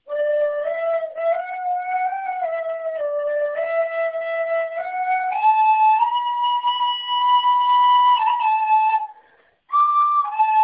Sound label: tin whistle